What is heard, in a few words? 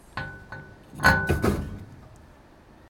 trash; ガラスビンを捨てる音; 矢野口駅[JR東日本・南武線]; 自販機